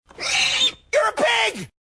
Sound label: pig